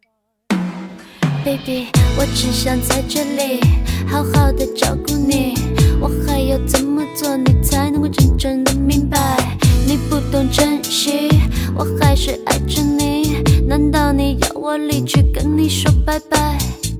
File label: chinese pop